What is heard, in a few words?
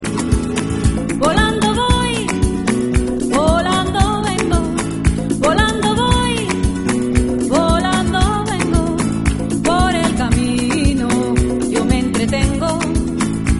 gitarre flamenco